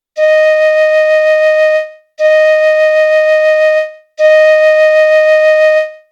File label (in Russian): 2 oktava mi bemol flejta